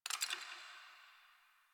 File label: Ice Crack One Off; Warframe; Ambience; Nineteen Ninety Nine; DE